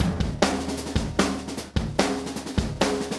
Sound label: Drums, Rock